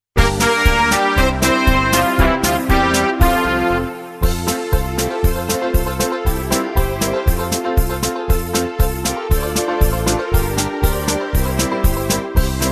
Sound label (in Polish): Karaoke